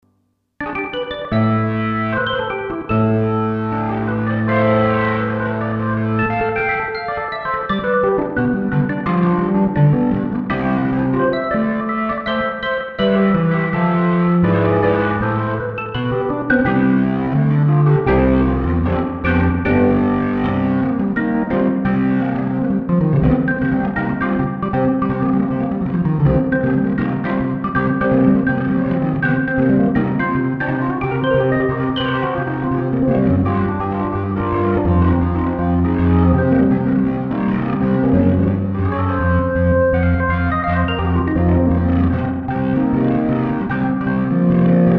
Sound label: Heavy Organ